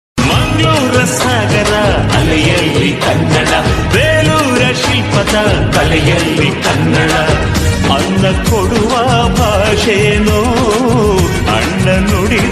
feeling song, kannada ringtone; kannada rajyosthava; ಕನನಡ ರಜಯತಸವ

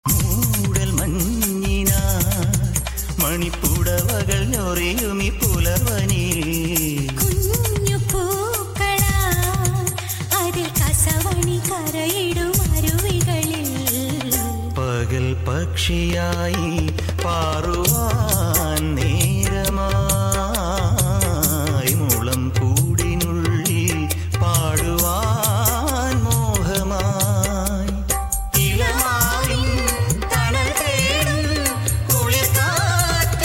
malayalam song; malayalam love song

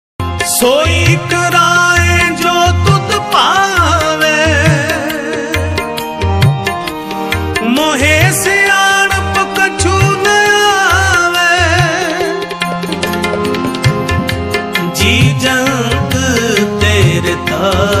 punjabi gurbani shabad

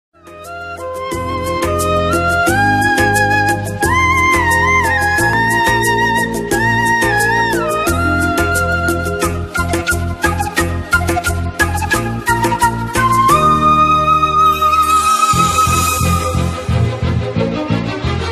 movie sad bgm ringtone south bgm; adavi ramudu the strong man baadal; prabhas

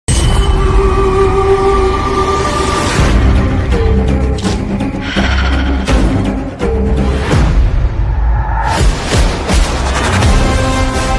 climax bgm; stree 2; rajkummar rao

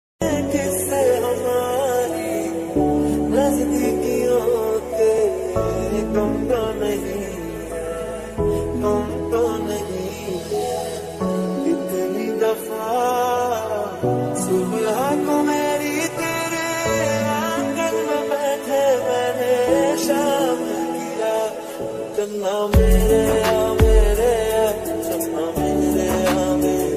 ringtone

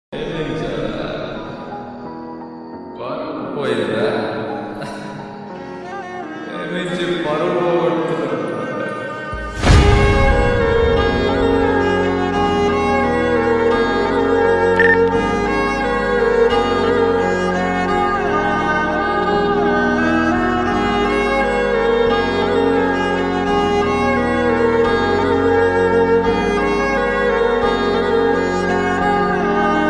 sad bgm emotional; raja rani movie

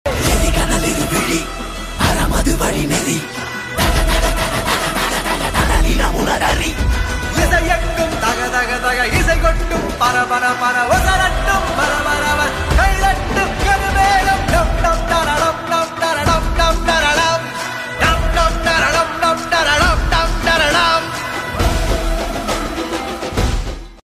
ringtone; Pa Ranjith

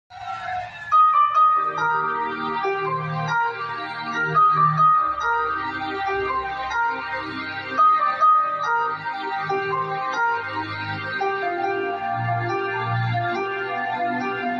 oye movie love bgm ringtones

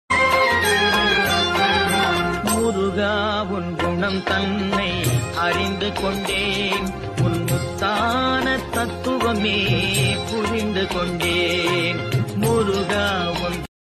ringtone song bgm ringtone tamil songs; murugan

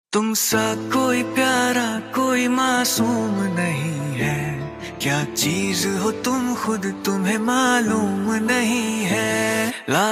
love song music bansuri flute ringtone

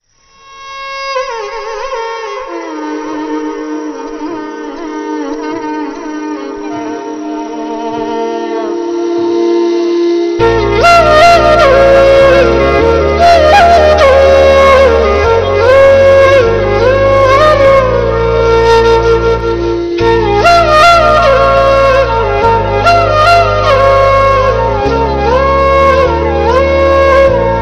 love bgm heart touching flute ringtone; janu; vijay sethupathi; trisha krishnan

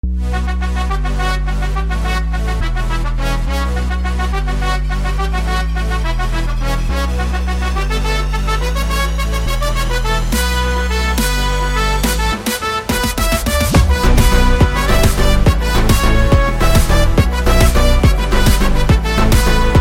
keyboard cover; aavesham

